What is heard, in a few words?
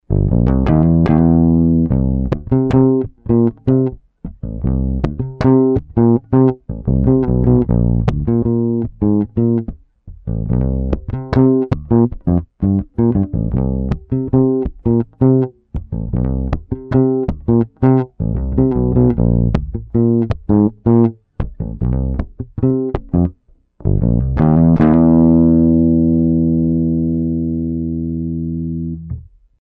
bass